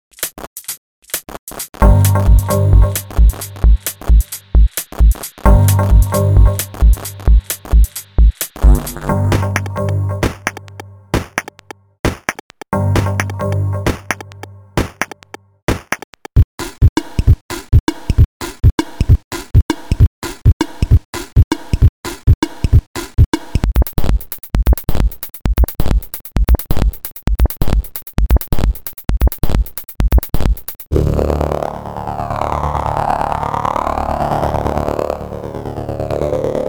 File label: Glitch & Dark Minimal House; Techno